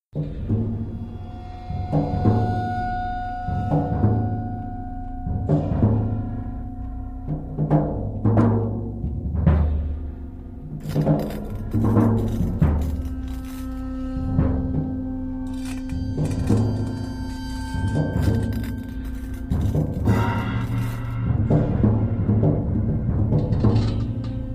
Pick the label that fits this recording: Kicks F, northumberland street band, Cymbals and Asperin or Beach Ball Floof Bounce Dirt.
Cymbals and Asperin